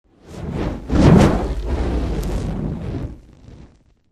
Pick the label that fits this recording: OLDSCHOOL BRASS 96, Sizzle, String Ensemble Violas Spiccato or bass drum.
Sizzle